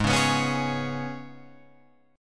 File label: Ab Minor Double Flat 5th, Guitar, Open F; strummed; 8,8,11,8,11,8; JGuitar